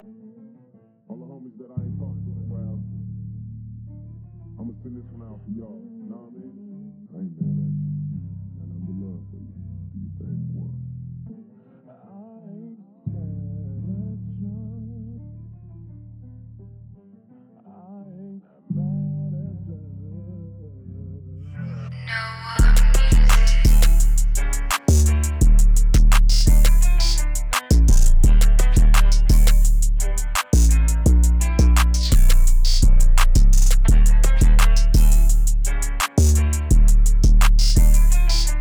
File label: 170bpm Dm